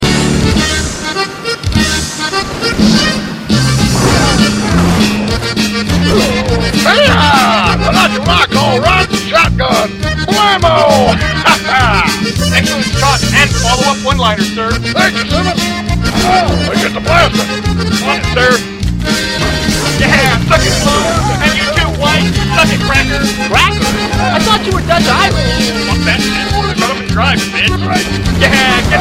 driving music

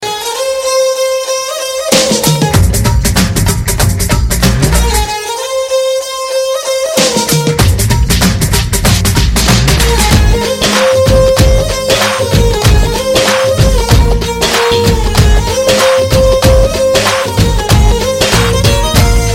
violin tune; classical

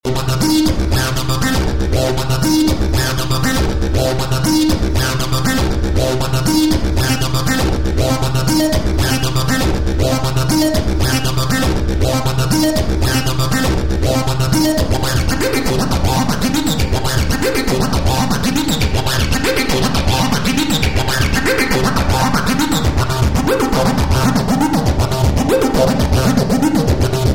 Bass Line

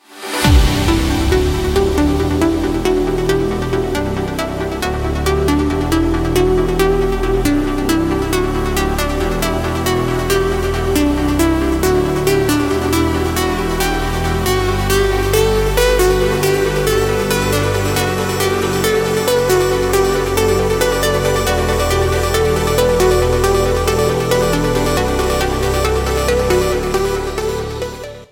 party trance, ringtones

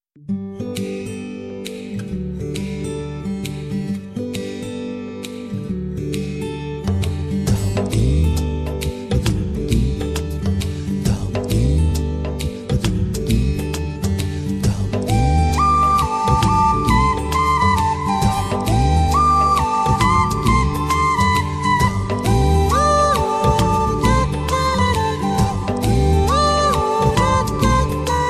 rose ringtone

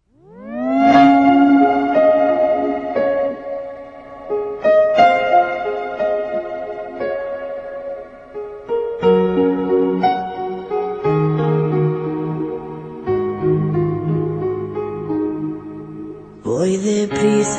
karaoke

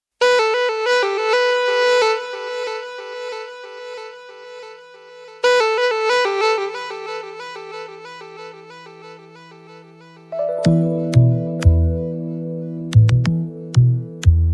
great n cool music, ringtones

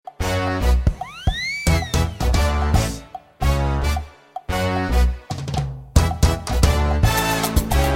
3 message tone; ringtones; sms

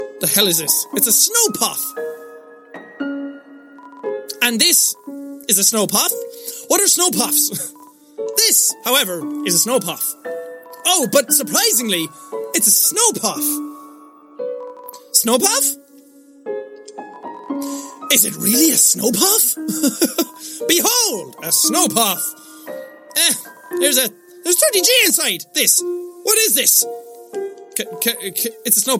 Snow Poff

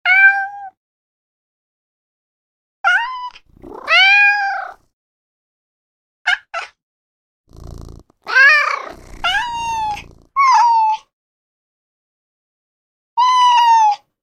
cute cat; animals

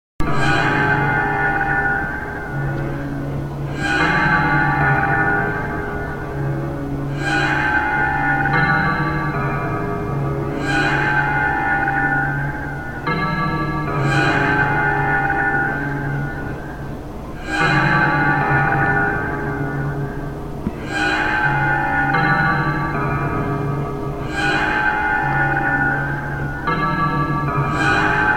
EVIL HORROR BELL

